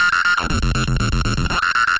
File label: 120 aggressive mono synth